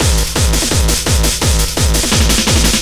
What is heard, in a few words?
LOOP, Eurotech; Sounds Good